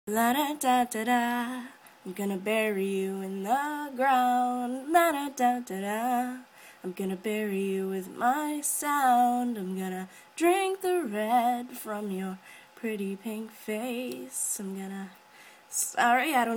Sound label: la da da bury you in the ground acapella